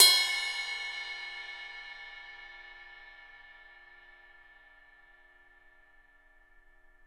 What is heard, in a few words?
Ride up; Digital Sound Factory